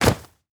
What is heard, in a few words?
footsteps shoes jump land beach sand